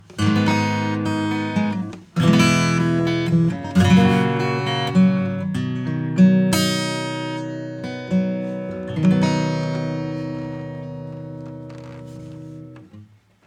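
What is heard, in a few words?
guitar; Gem EQP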